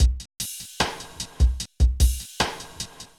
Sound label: hiphop